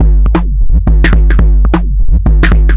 groove break filtered, drum loops and breaks, 173 bpm; 161 to 180 bpm